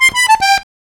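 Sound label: Accordion Lick, C; Zero-G Kocktail Kollection; Accordion Lix; 90 s Sample CDs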